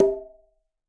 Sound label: Quinto B Open, Conga; Latin Percussion; Drums; Drum Hits; Ableton; Factory Packs